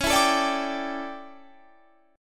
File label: D Major 7th Suspended 2nd Suspended 4th